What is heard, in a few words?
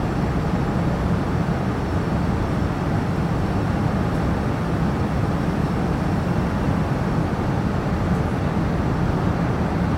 Airplane; iOS Sounds